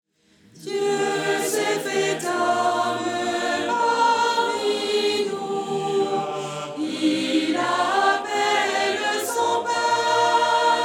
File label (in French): soprane; dieu s'est fait homme parmi nous